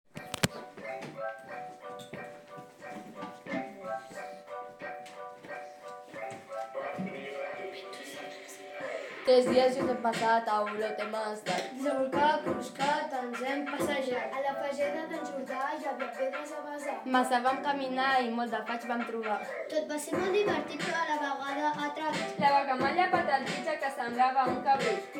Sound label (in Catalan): Rap de les colonies; Camp d'Aprenentatge de la Garrotxa